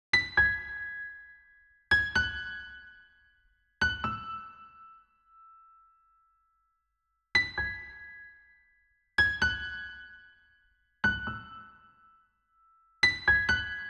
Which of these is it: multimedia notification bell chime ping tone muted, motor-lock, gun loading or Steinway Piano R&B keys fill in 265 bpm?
Steinway Piano R&B keys fill in 265 bpm